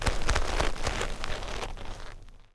leather; lucius

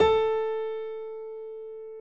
A Piano

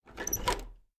Door Handle

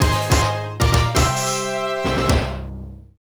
Victory Decision; Exvania; Namco NA-1; arcade

